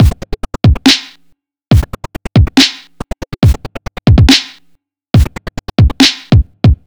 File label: Gated Beat, 140 bpm